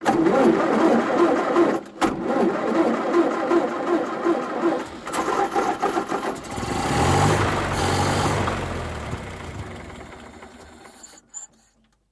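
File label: car starter sounds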